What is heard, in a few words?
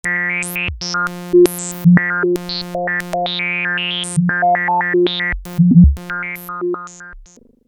Random Sound; Europa